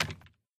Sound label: Propeller hat Caveman Off; doodle jump 2; android